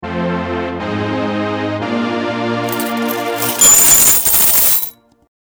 colors win long

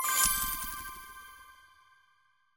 Gold Pickup

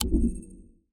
ui menu teleport exit

